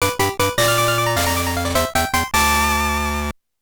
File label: Fanfare - Bug-Catching Contest Winner